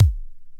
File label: BD DX 7, bass drum; BASSDRUMS